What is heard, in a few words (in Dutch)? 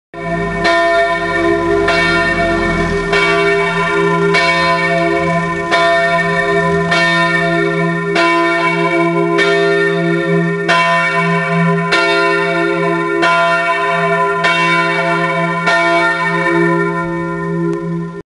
klokken luiden